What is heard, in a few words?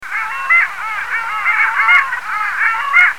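long-tailed duck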